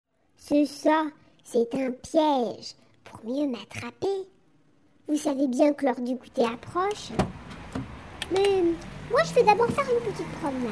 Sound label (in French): voiture; tremignon